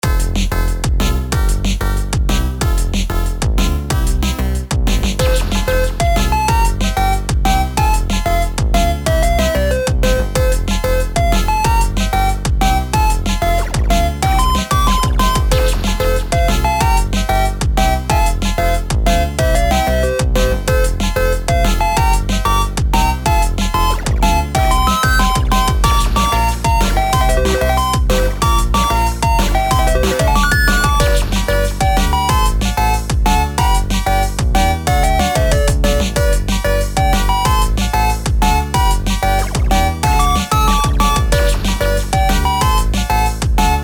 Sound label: music